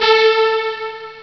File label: a synth; ST-XX Amiga Soundtracker Sample Packs